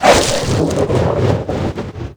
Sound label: dog kill sound; lethalcompany; zerosiki; slender